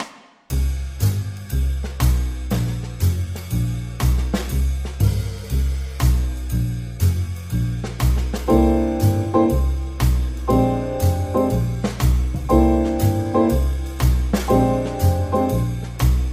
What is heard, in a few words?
piano recording